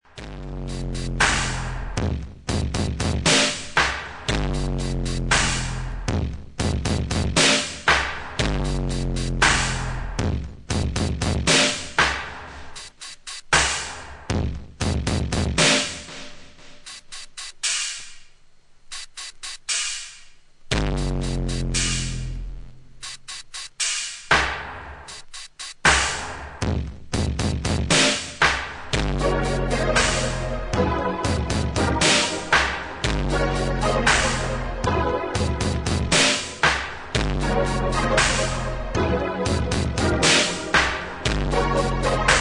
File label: AMBIENT EXPERIMENTAL